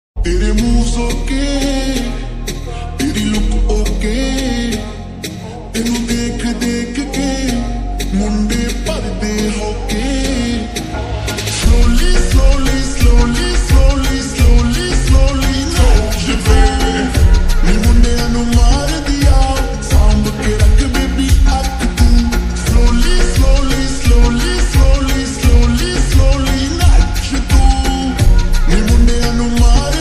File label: slow reverb punjabi ringtone